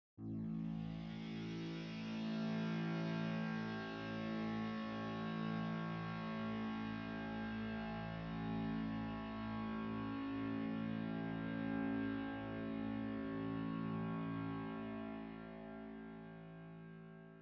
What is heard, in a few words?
Synths Big Sitar Pad; Remix Part